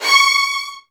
Virtuoso Strings; ILIO; Kirk Hunter Virtuoso Strings VOL-3; 90 s Sample CDs; LRGE STR HRD

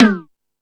Hip House, Drum Machines E-MU Pro-Cussion

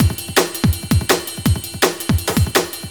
Break, Jungle; Inner; Breaks

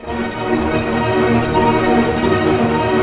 STR ARP MI, Symphony Orchestra, STR Arpeggio; Roland LCDP08 Symphony Orchestra; STR Arpeggios

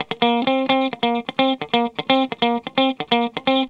GUITARS 130, House

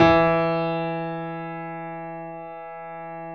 E 2, Keyboards Acoustic, GRANDP ST; USB Soundscan vol.17

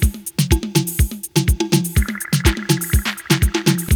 SOCA BREAK D, BREAKBEATS; Crypton - DrumBase5000; BREAKBEATS#2

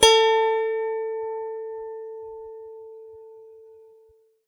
lap harp pick A5; Ethnic

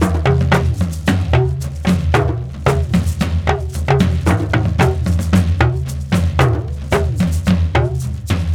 Bag Pipe Groove, Rattle, Brekete, Djembe; Berimbau

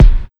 91 DRM SND, Pure Electro; USB Soundscan vol.03; AKAI

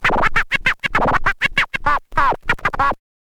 scratch kit, vinyl

